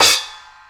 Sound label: Choke Crash One-Shot, cymbal; KJ Sawka; Drum n Bass & Beyond; Drum Tool Box